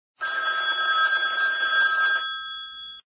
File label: ringer office; INOI 283K